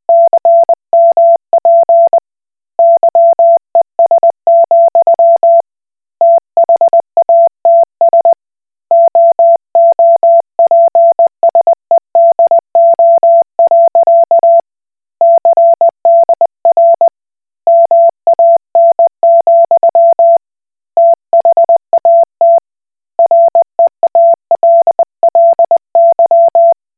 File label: Koch Morse